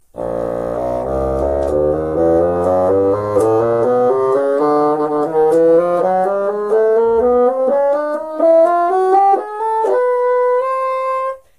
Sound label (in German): fagott